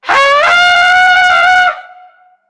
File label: Elephant Trumpet; Wildlife videos